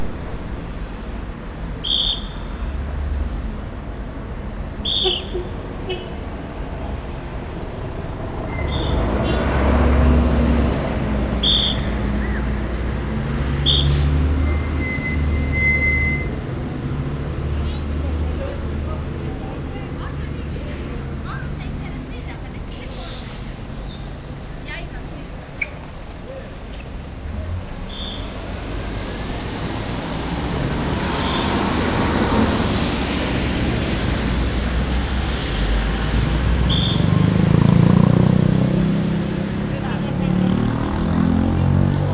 forgalmat megallito sipolo rendor

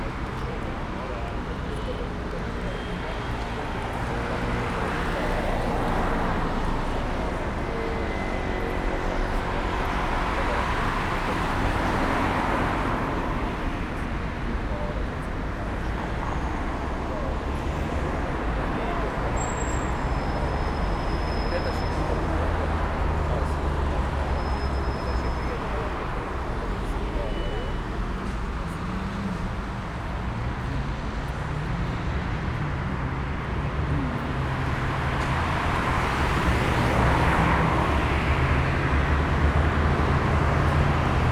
villamos; 14es; ujpest fele; bekeut

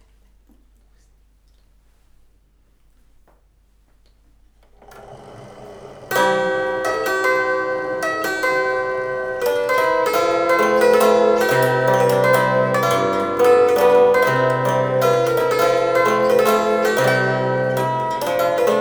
automatikus citera; 1904; nemetorszag; verkli

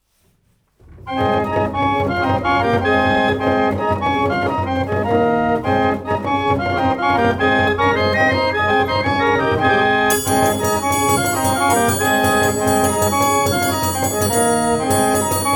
szalonkintorna, 16 hangú, 3 henger, 8 dallam; 1810; anglia; verkli

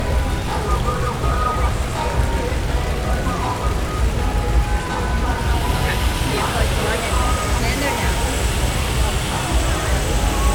idozitett szokokut

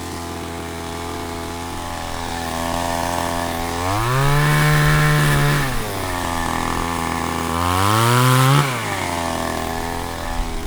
benzinmotoros láncfűrész

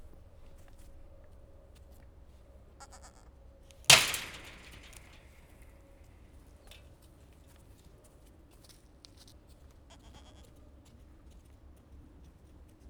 kiskecske nekimegy a drótkerítésnek; jaszberenyi zoo 2016

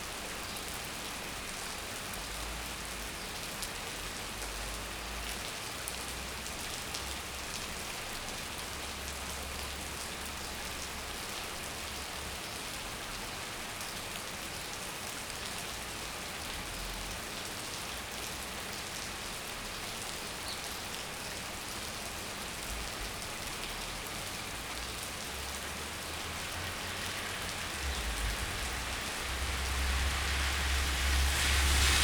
bezárt pékség terasza, balról egy autó, eső; potamia; thassos 2015

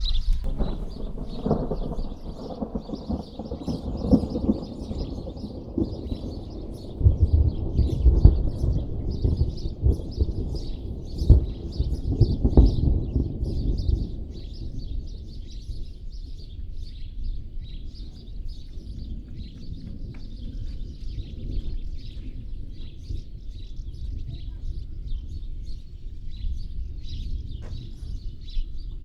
távoli démély, mennydörgés

